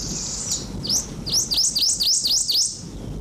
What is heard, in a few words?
Great Goldfinch; woods; Ariel Foundation Park